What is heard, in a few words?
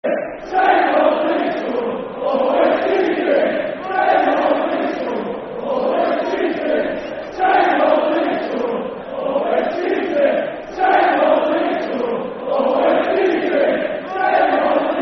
Same Old Leeds Scum [Football Chant (Soccer Song)]; Bradford City Bcfc